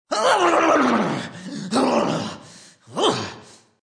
Vocal Neigh, Vocal neighing, shaking, comical, funny, mouth FX; (human voice); Exclamation; VoiceBox